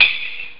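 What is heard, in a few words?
closed hi hat; jazz jackrabbit 2; M8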